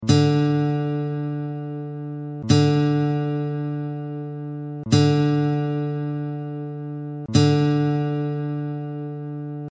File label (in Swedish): D-strängen, gitarrackord